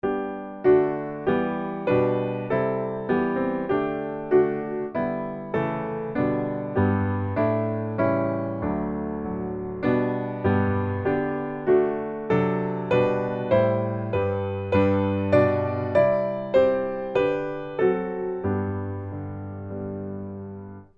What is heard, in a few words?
piano